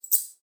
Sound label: Coins